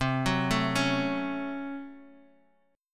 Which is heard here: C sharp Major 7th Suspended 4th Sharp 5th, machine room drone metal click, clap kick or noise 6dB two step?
C sharp Major 7th Suspended 4th Sharp 5th